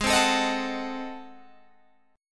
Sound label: Ab 7th Flat 9th Guitar Open G, chord